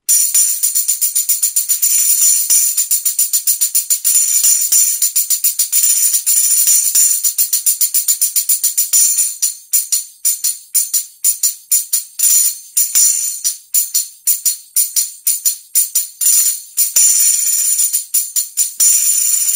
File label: tambourines